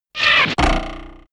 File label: arrow impact